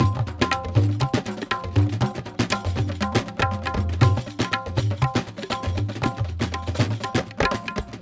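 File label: music world music action part d percussion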